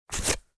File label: Wallet removing credit card; purse